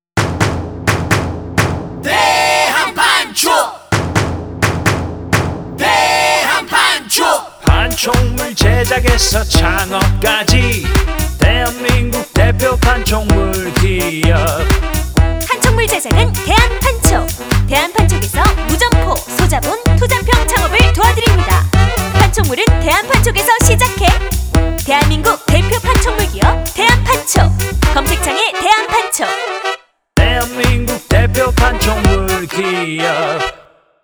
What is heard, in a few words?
melody